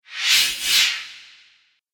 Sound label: Fast In And Out Zoom, whoosh sound effect